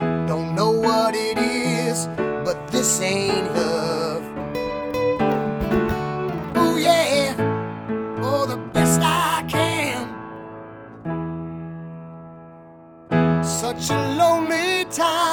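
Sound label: Piano Voice Dry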